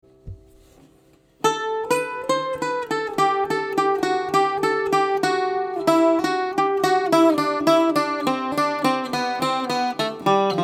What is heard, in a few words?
high scale, cello